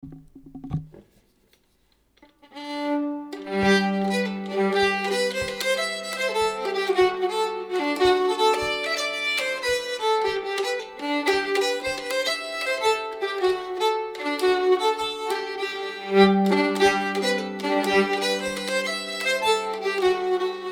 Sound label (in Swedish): Tierpspolskan